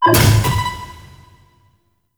Vaults No Key; Gameplay; Warframe; Entrati

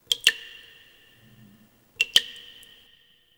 Terrarium Drips; Warframe; Ambience; Entrati

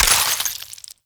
Ammo Pickup Viral; Warframe; Frumentarius; Ammo Ability

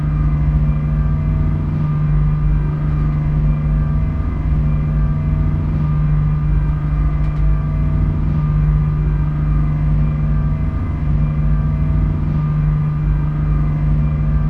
Dojo Elevator Loop; Ambience; Warframe; Mod Quest; Teshin's Hideout

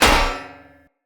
Sphere Board Impact Default, Shields; Weapon Impacts; Sphere Sword And Board; Environment

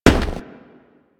Crewman Footstep Walk; Warframe; Corpus

